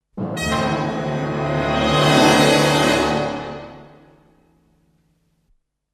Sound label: Dramatic Impact, Production Music; Ren & Stimpy - Production Music